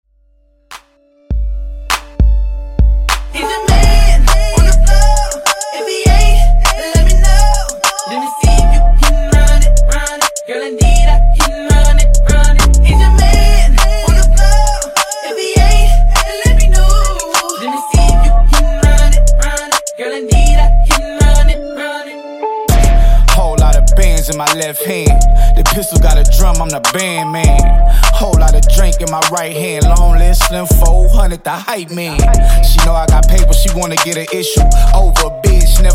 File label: Breakz Intro Outro 101 Bpm Clean Dirty